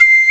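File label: woodwind C1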